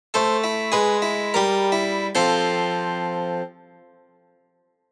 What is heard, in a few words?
Gameover by Harpsichord